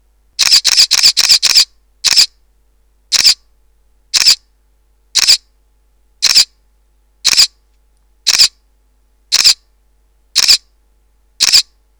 E-620 continous hi, Olympus

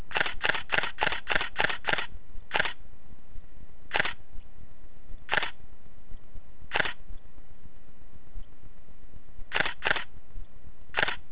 raw, Canon EOS-550D

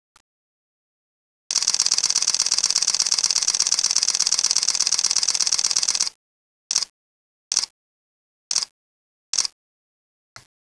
EOS-1DMarkII high speed, Canon

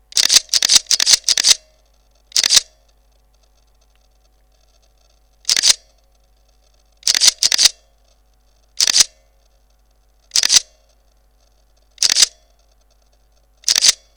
K200D continous high RAW; Pentax

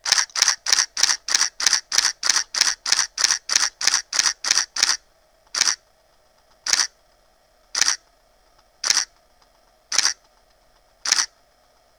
continuous lo RAW, Pentax K-7

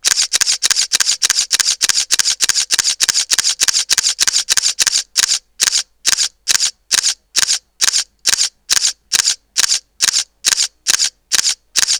Olympus E-450 continuous; JPEG

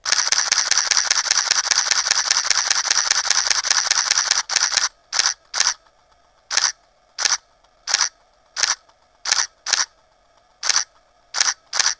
K-7 continuous hi JPEG; Pentax

